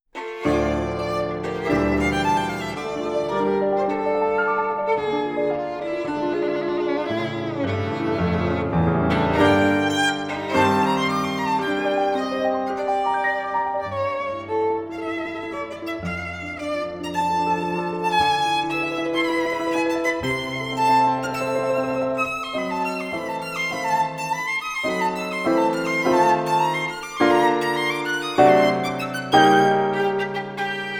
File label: Sonata for Violin and Piano in D Minor Op 2 I Allegro Animato